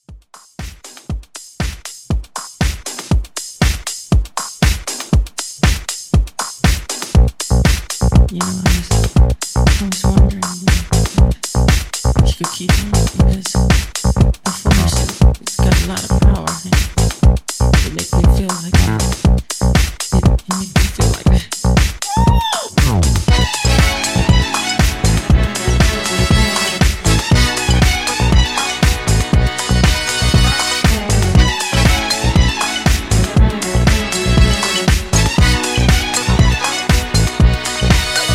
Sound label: 70s Pop Re Drum 119